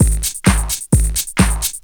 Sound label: drum 130 straight; Techno Trance; DRUMLOOPS; Techno Trance Essentials